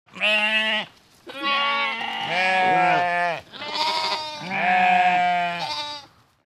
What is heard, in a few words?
Flock of sheep bleating